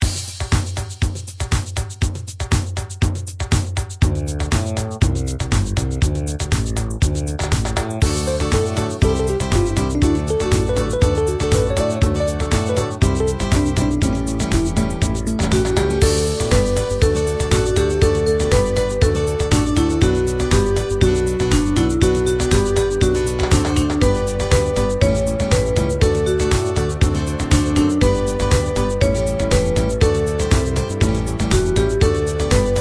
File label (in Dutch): karaoke; keuring